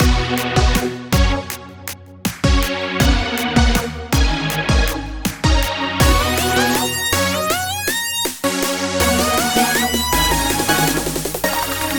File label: Backing